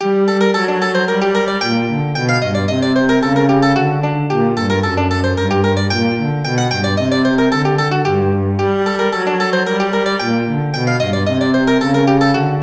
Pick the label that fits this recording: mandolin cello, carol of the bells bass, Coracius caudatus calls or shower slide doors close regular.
mandolin cello